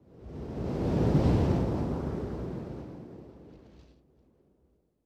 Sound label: wind buffet; ModernWarfare